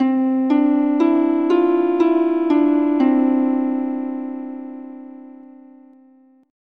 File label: Orch Harp